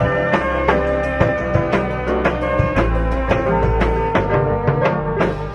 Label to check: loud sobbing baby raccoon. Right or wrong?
Wrong